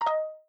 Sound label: audio Alert